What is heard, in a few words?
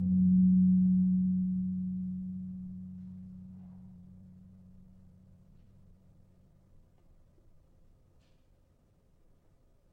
Gong 3 G, gamelan tuned